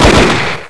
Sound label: colts fire; wanted; weapons